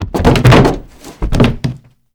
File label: BF On Metal Slow Bouncy; Fairlight Sound Lib